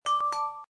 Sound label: incoming